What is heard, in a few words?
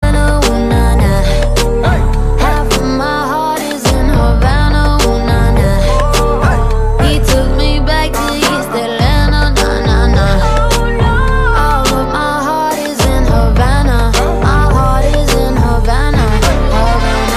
remix